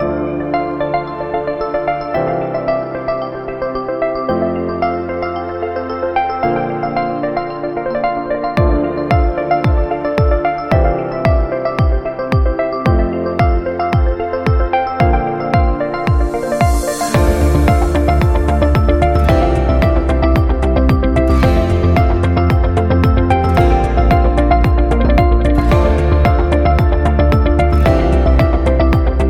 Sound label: Inspiring, corporate music; corporate music vol 21